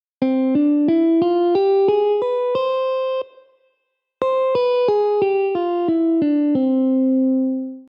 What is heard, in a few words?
Harmonic Major